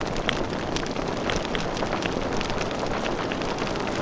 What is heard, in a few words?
Torch Small